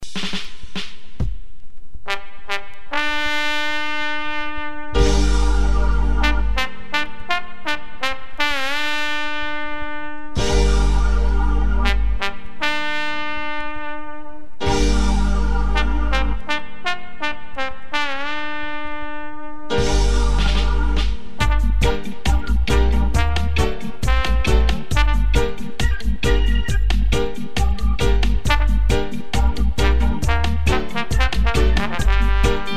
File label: horns